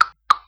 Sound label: click clack